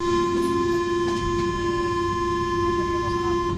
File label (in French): ronfleur